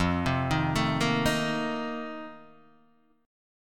F 11th, Guitar, DADGAD

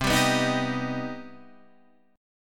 C Minor Major 7th C Guitar Half Step Down

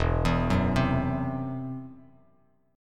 E Suspended 2nd Sharp 5th E down Bass Standard; Esus2#5 arpeggiated

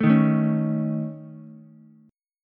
C sharp Suspended 2nd Sharp 5th C sharp Ukulele; C#sus2#5; strummed; JGuitar

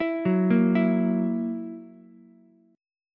E Suspended 2nd Flat 5th E down Ukulele; arpeggiated; JGuitar

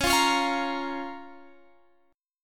F Suspended 4th Sharp 5th C sharp

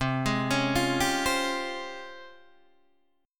Db Major Sharp 11th C

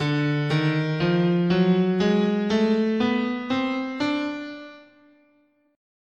D Diminished Half Whole, guitar, scale

